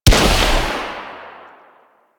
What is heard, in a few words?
Arch Rocket Crossbow 2D Shot, Weapons; Warframe; Archwing